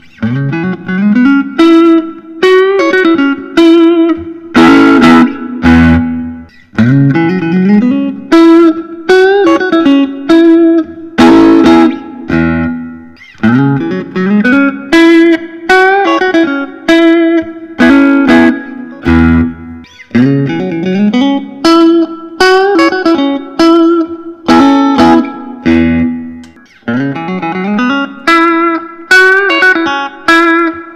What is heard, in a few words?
Fender Custom Shop Wildwood 10 61 Strat